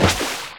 Water In; bloo kid 2

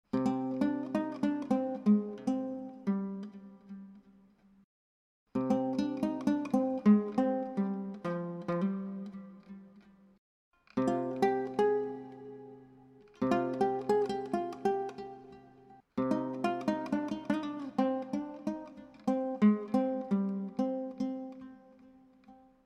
Dombra Loop; FF Dombra Loop Tempo Fix

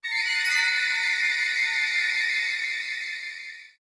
Flourish, soundtracks; bolt 2008 pc gamerip

